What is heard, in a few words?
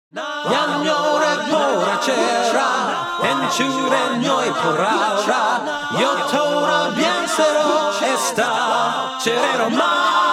LocoRoco - Acapella Version